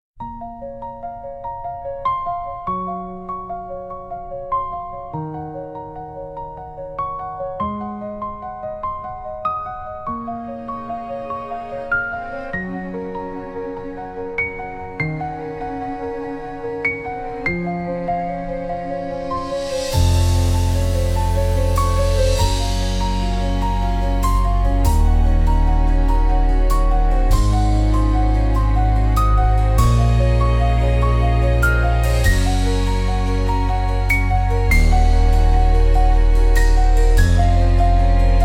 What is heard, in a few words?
Noise